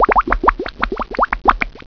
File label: BUBBLES